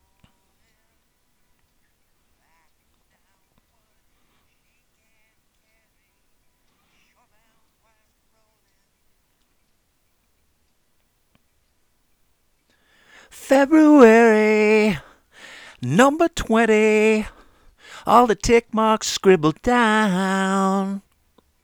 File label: Bright Vocal